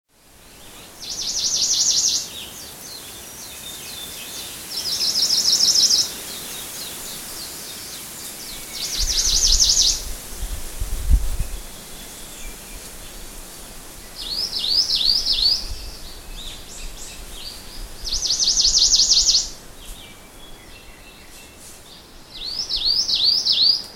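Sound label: Ijima's Leaf Warbler, nakanoshima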